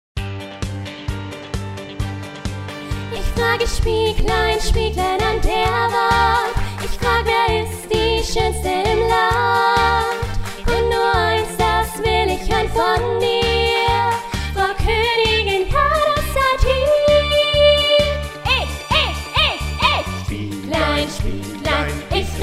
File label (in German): Spieglein Reprise Vollplayback; Schneewittchen